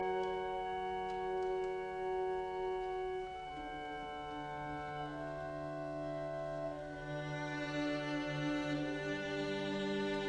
Cello Concerto in B minor Op 104 B 191 II Adagio ma non troppo; Dvorak